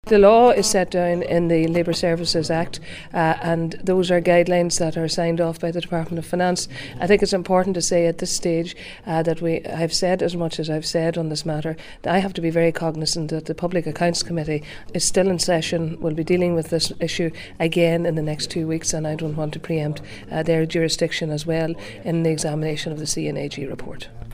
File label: cough